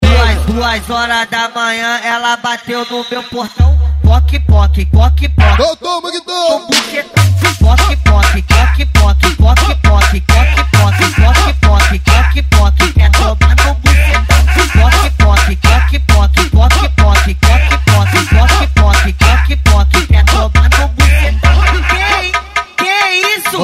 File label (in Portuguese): funk ritmado; especial cuiaba